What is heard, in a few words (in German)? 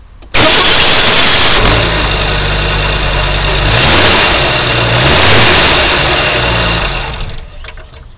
115ps anlassen; audi100